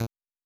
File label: hover main menu